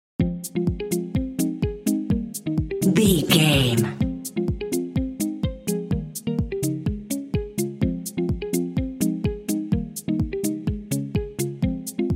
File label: indie pop guitar